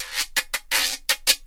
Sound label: guiro scrape; Drum loops